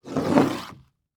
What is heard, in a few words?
Foley Footstep High Heels Slide On Hollow Wood Surface; Foley Footsteps